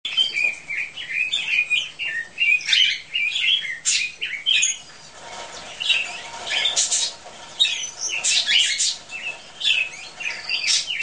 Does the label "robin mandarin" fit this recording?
Yes